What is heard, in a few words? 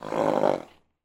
Horse Clydesdale; Blastwave FX